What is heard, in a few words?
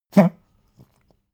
pug gag smack medium; natur-tiere; hunde